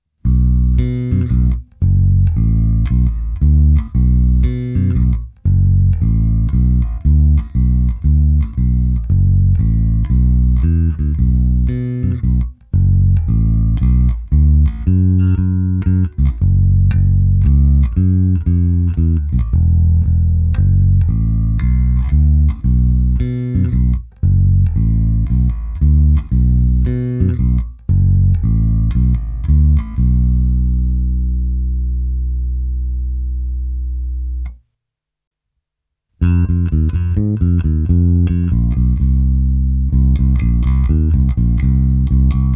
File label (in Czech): Ukázka se simulací aparátu, v ukázce je použito i zkreslení a slap; Sadowsky NYC Ultra Vintage J Bass Guitar; kompresor TC Electronic SpectraComp a Darkglass Microtubes X Ultra se zapnutou simulací aparátu